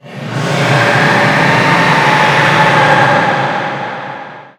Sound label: diablos distant